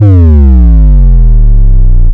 jungle sine

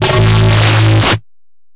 unplug me, bass

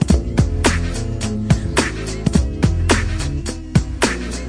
r n b ripoff, DRUM LOOPS and BREAKS, 107 bpm

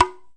mute, Japanese Drums; ETHNIC and WORLD PERCUSSION; ohkawa

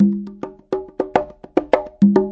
090 bongos, 090 bpm; Bazillions of Bongos

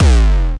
desecrated bass hit, evil bass sounds

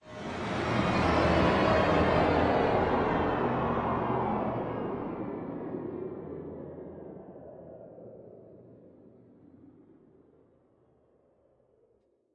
come down fx; Atmospherics

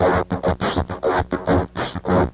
distorto grungy percussosynth, 102; SYNTH AND ELECTRONIC LOOPS

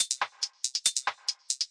140 bpm verby click closed hats

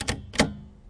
keypress, Typewriter; UNUSUAL KITS and FX; Bauhinian Vibes Typewriter Kit

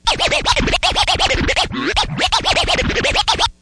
going off, Scratching and Turntablism

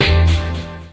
RnB stab; Punches Hits Discoblasts

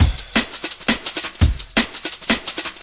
crash wide thick, drum loops and breaks, 170 bpm; 161 to 180 bpm

